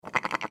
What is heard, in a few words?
Sheep Baa; Animal